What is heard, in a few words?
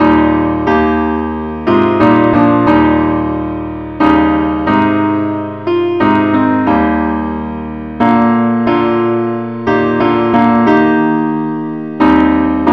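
parts only key E flat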